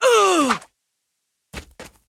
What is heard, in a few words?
Recoil; SFX 3D Overseer